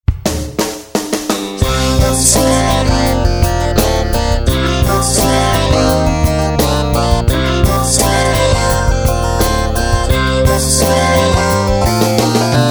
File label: Jingle, Radio; Tribal Smile